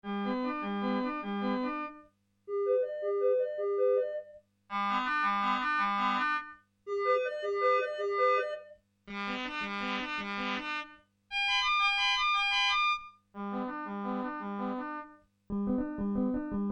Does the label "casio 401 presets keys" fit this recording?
Yes